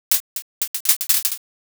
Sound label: firecracker